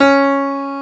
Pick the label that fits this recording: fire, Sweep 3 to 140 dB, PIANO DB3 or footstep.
PIANO DB3